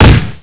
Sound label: akimbo shot, weapons; GoldSrc